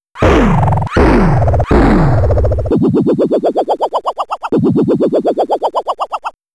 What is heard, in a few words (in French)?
Coup et tête qui résonne; les coups et blessures font partie du jeu; Goblins 3; cartoon